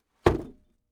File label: crate of rocks; prosoundfx